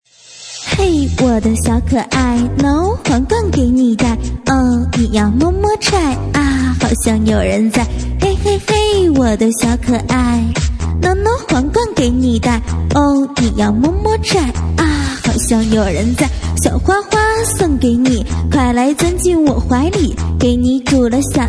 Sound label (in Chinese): 喊麦